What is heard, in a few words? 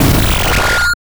Hit Distortion